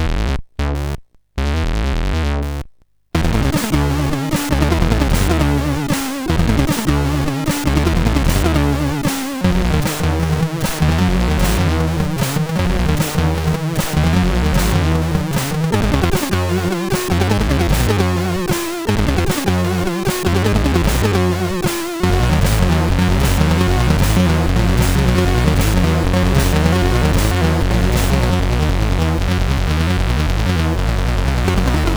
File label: Scanner, MOS6581R3